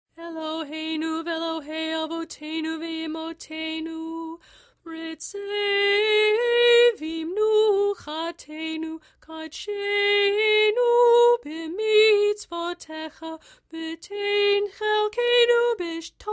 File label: Retzei Vimnuchatenu sung; saturday morning